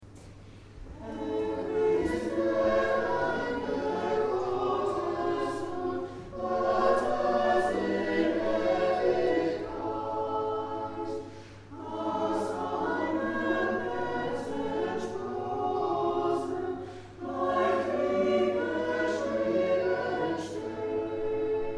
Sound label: Herr Christ Chor; Orgelbuchlein